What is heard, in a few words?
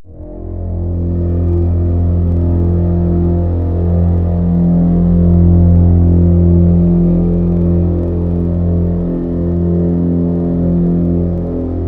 ST High Strings; Synthetic's Serum Collection; Echo Sound Works Grey V.1